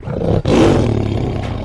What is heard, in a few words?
Tiger Roar, big cats tigers